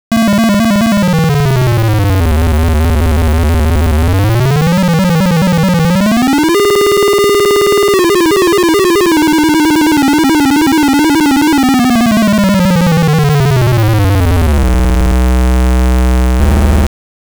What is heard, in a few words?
guitar